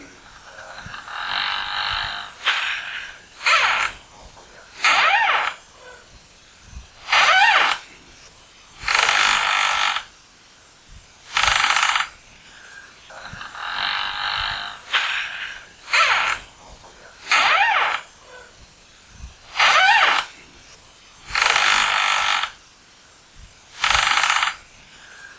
bruits porte

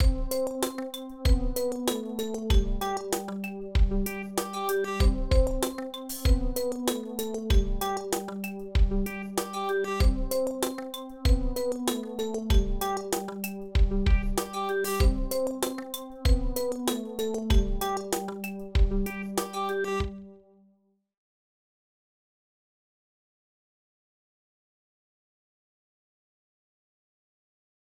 synthe batterie; mankind concept

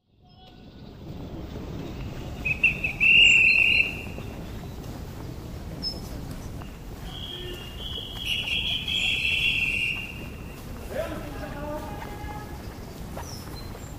fermeture du jardin

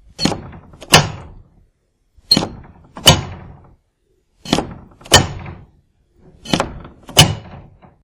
verrou porte garage

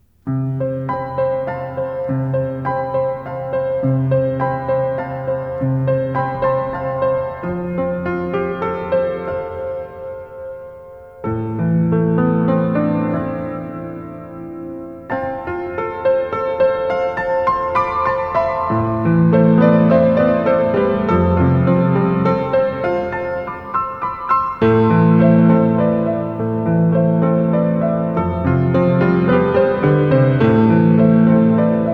Douceur au piano

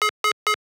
reveil matin; la chambre de schrodinger

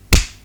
claque

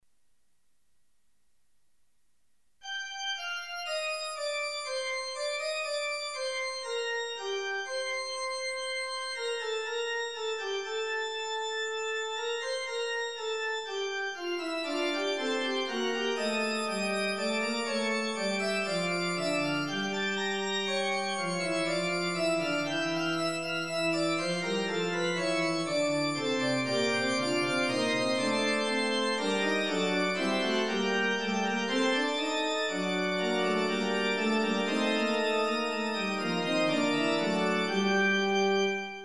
Essai fugue orgue

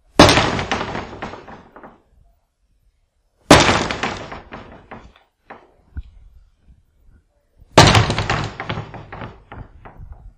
coups porte garage

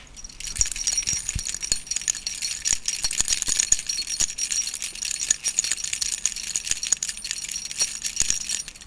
cles

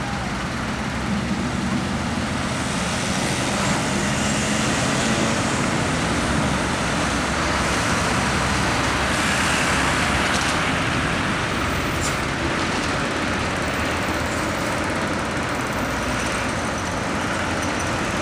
ambience exterieur; la chambre

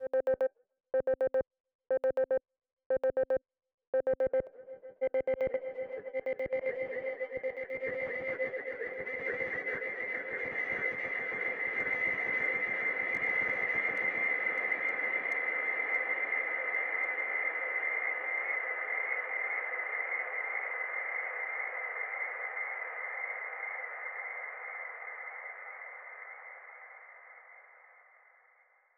reveil matin; la chambre de schrodinger

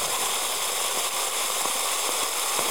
son petite cascade

